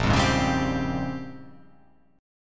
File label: Bb Minor 13th, Bbm13 chord; strummed; JGuitar